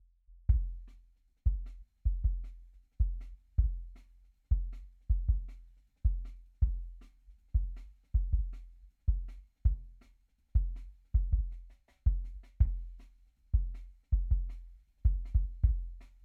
KICK SUB, drum n bass; THE RAIN RIDE CYMBAL; RAW MULTITRACKS

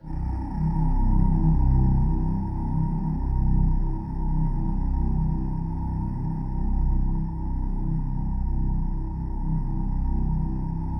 C1, Synth Leads

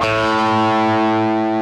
DIST A2, DIST GUIT

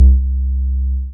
BAS MINI, Mini-Moog

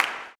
CLAP, blues & soul; ILIO; Vocal Planet

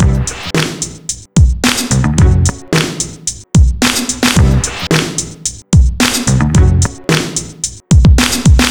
Dont stop hip-hop 110, breaks, breakcore

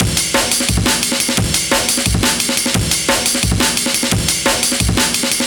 Amen EQ 175, Classic Remastered Jungle Breaks

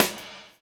DOWN, DOWN KIT 78

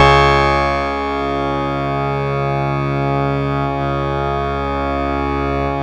C#, PIANO; Big Fish Audio; Synclav FM

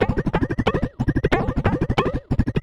THWACKED, arpeggios; ILIO; Trance Fusion

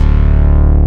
SUB BASS; E-MU Formula 4000 Series Vol. 2

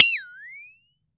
FLEXATONE; AKAI S-Series CD-ROM Sound Library VOL-5

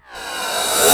REVERSCYM, CYMBALS; USB Soundscan vol.20 - Fresh Disco House I

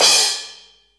crash, short decay cymbal

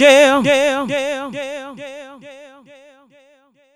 "YEAH" VOX, Funky Vocals, ADLIB MEN; USB Soundscan vol.18; AKAI

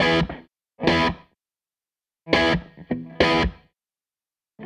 Gretsch Guitar Loop

